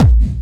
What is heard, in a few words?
Kick Boom Kick